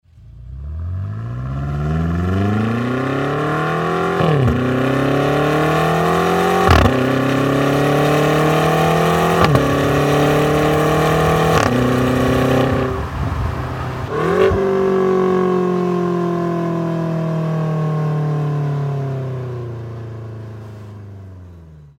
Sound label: BMW M V8 Acceleration; x5m; 2014